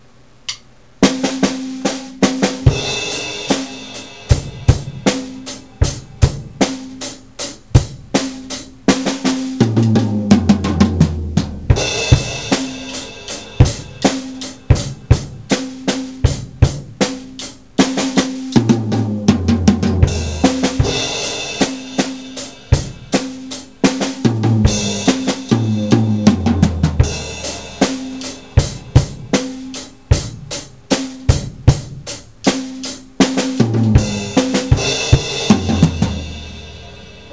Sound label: solo, drums